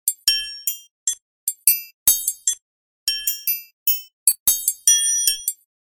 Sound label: Orchestral Triangle; C1-B3; Drumwavy; Syntheway